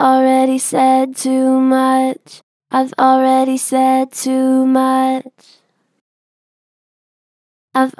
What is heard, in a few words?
Gen Flat Already said too much, Vocals; Harmor Vocals